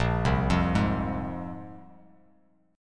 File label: A sharp 7th Suspended 2nd, Bass Standard; arpeggiated; JGuitar